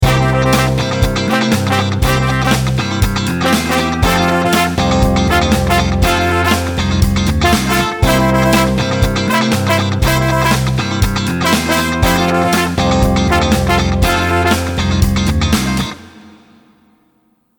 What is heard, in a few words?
funky animator